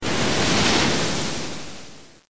water

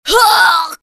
howl; nvyao

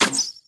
sword

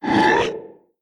howl wraith

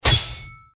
beat

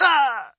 hurt

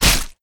beat Paw Strike

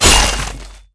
hit; liqi

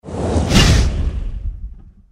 atk yunshi

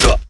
hurt

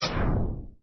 hint pause

